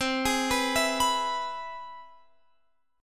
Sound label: C Augmented Major 7th, Guitar, Open F; arpeggiated; JGuitar